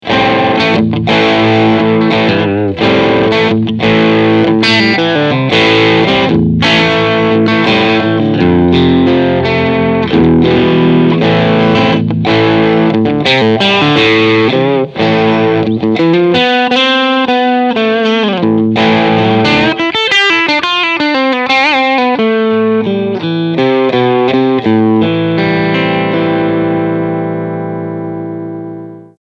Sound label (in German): Crunchsound Steg PU